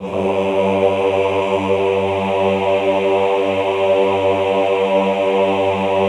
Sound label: AHH G1 R, Classical Choir; Best Service; Extended Classical Choir; AHH LO+HI LL